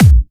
Bassdrums Clubhouse; Vengeance essential club sounds-3

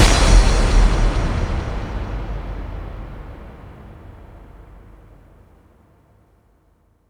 bassdrum reverb FX kick; Vengeance Samples

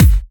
Sub Perc, Bassdrums; Vengeance Samples

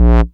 Bass Multi Rev B; Vengeance Samples

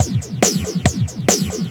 Breakbeat; Vengeance Samples; VEC2 Loops

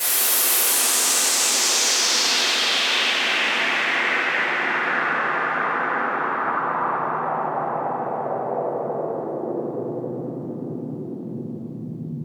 Downlifter

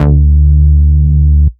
Bass D, Root D

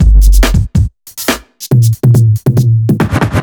Loops Fills; Vengeance Samples

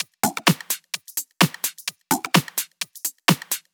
Drumloops 128BPM

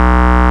Root B, Ultimate Bass; Vengeance Samples

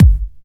Hard Kick; Vengeance Samples

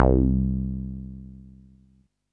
Basses C; Vengeance Samples

